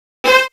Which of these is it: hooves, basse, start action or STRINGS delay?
STRINGS delay